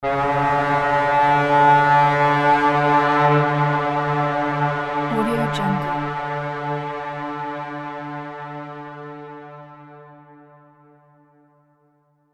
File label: battle horn; funkyvox